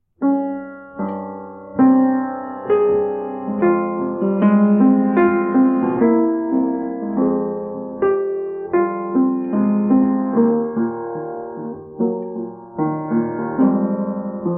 Sax piano

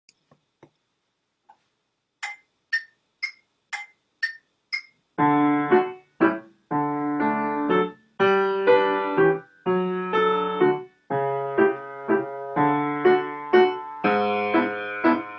Minuet; A.Carse

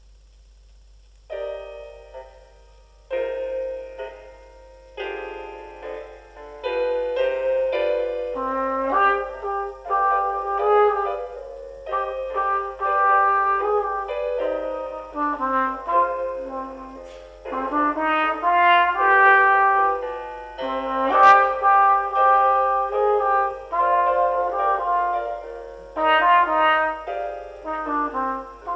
Waltz for E Trumpet; Grade 1

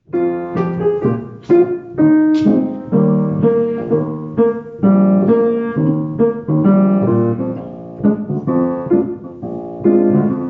accompaniment

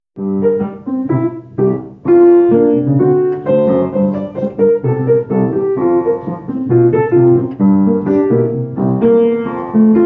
accompaniment

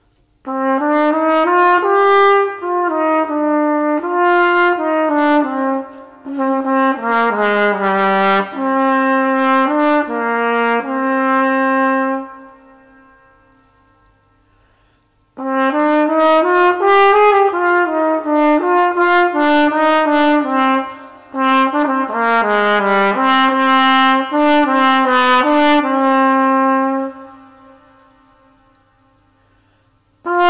Trumpet, NO PIANO; Grade 2

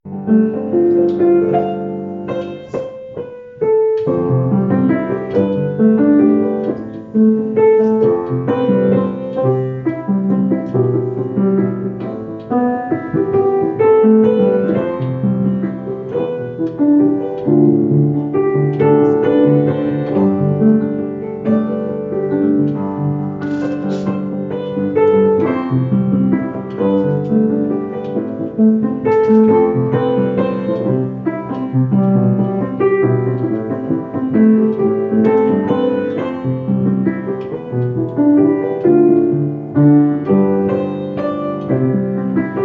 accompaniment

